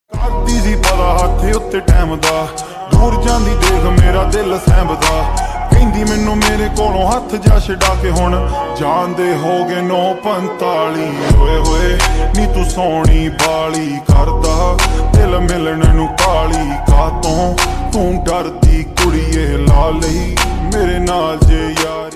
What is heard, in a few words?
Punjabi song Slowed Reverbed